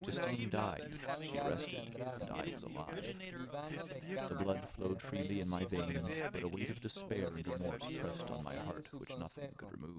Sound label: male inst sim; SASSEC07